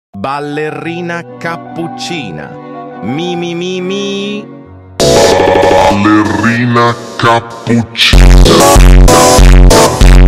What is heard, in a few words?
fonk; balerina kapuchino mimimi